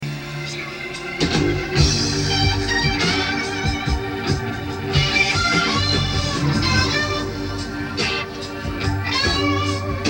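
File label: Power Boost and Big Muff, guitar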